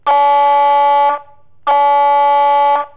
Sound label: light horn baguley